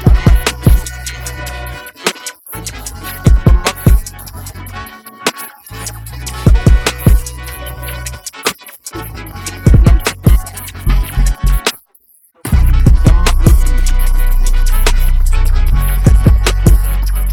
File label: Instrumental; Clarity Vx Pro